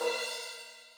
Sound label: ride, Millenium MPS-400